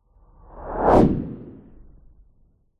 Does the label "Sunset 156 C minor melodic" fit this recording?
No